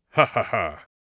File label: laugh, Mage Voice Pack